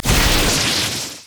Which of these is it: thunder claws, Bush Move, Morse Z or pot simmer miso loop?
thunder claws